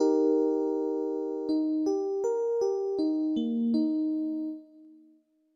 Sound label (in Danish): skala e es